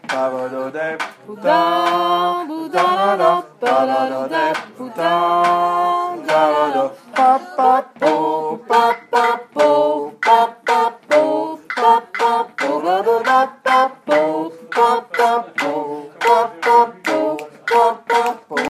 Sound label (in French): Basse